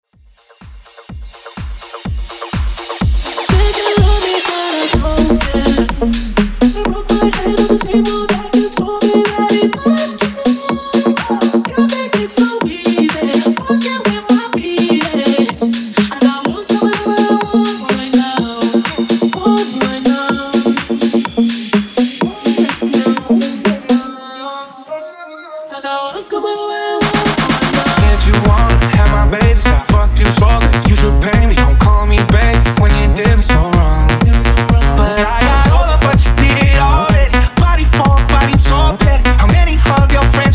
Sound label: Future Rave Mashup; Top40